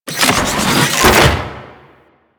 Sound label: Storage Locker Open